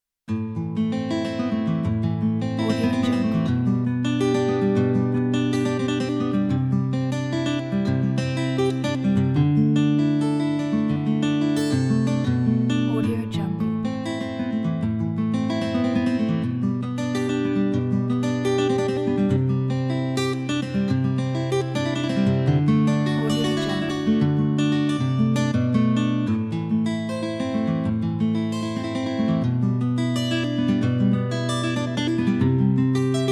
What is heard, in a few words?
pathetic guitar